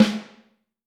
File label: snare drum; Q Up Arts; Johnny.C's Vintage Snare Drum; 5X14